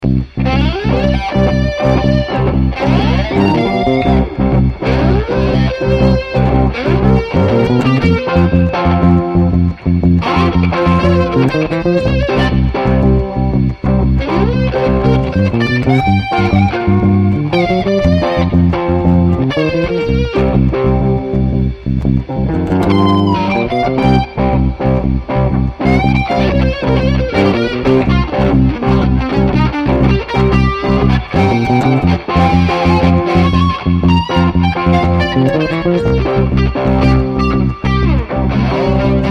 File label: blues g